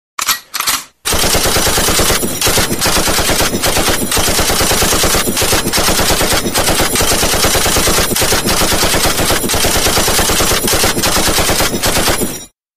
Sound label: M249